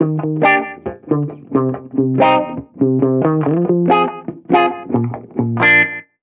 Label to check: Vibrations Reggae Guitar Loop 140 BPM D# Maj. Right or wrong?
Right